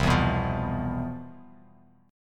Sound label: C Major 11th C; CM11 strummed; JGuitar